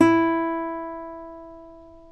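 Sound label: E3 CLASSIC, CLASSICAL GUITAR; Northstar - New Gold VOL-2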